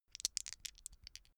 dice shake hands open short

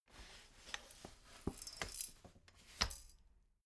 folding chair fold slow

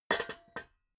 pots rattle soft

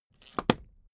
suitcase close latch lock hard

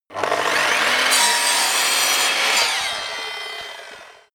table saw on load

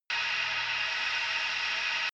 drone spaceship vent

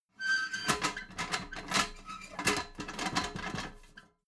metal ladder small handle short

